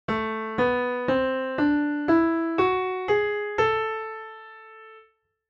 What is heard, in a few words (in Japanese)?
メロディックマイナースケール, piano